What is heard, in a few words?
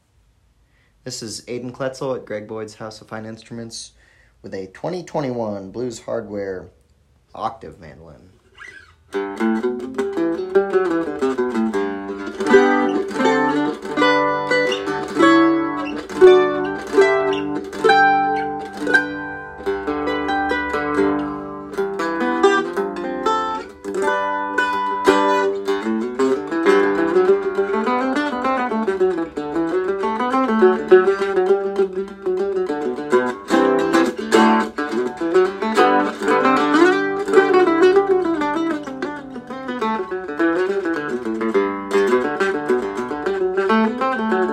octave sound ports; Blues hardware